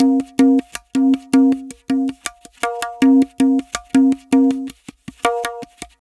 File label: Pure Tabla, 080BPM; Zero-G